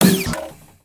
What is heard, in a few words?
On Hit, creatures mechanical; Inventor Broombot